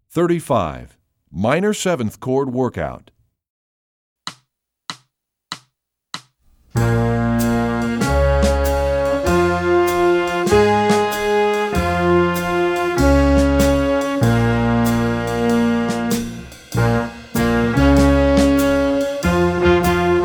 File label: workout minor 7th chord Gmi7